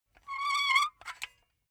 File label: silicone gun empty pump slow; berufe; handwerker; soundpool